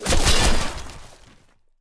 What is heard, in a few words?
action shootout